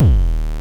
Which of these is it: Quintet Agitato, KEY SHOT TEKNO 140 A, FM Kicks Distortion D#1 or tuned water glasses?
FM Kicks Distortion D#1